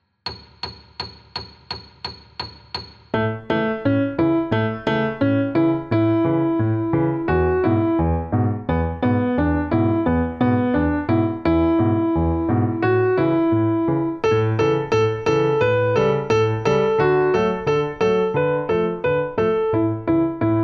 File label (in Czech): metronom, klavír